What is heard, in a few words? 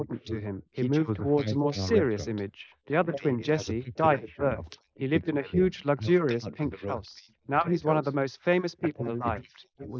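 male, instantaneous mixture; SiSEC11; underdetermined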